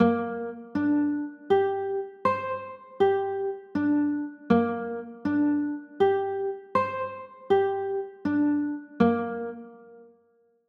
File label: c 6, chords